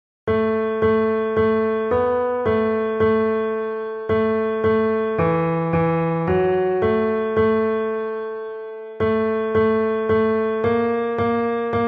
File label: tenor; the churchs one foundation